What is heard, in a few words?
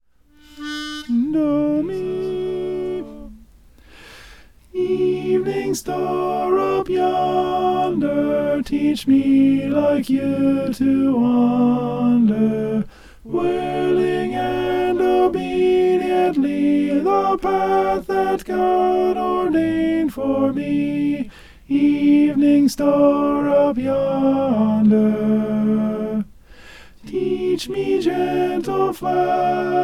alto